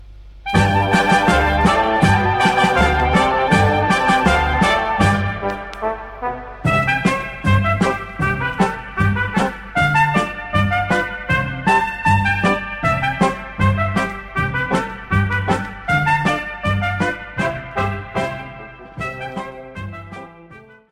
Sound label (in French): Landler - Allemagne; Zillertaler Landler